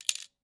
Foley Coin Dispenser Insert Penny; Frogsong

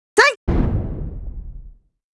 Cleric M Attack

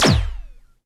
Small Multi Beam

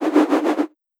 Fire Elem Inferno

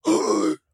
Ruins Sentry Fat Startle

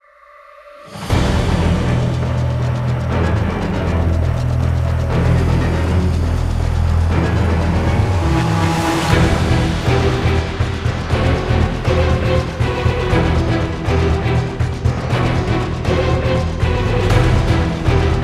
Event Suspense Light

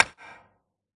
Sfx Baseball

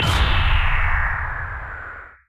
Large Sustained Beam

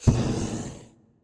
Roaster flame start

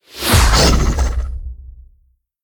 Monster Spawn; Monster Lizzie

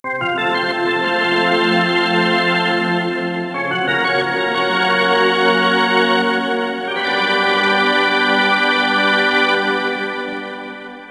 Str G#; Pro Yakyuu Spirits 3 (PS2) (gamerip) (2006)

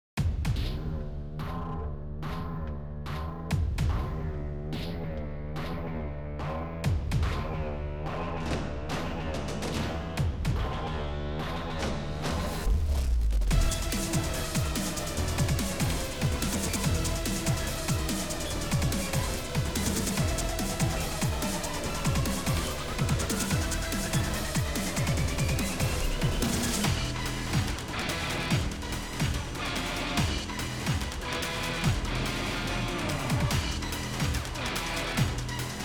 Battle Boss; Type Civil

